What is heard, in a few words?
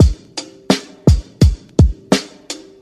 big scratch, boombap drum loops